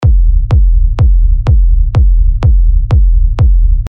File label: Kick Loop D# (125 BPM); TECHKICKS1